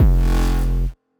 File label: Low Blows Bass